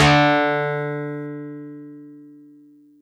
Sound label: Easy Clav DX100 D3